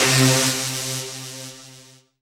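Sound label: Rave Synth, Dance Mega Jungle Rave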